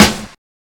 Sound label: verby snare sample g sharp key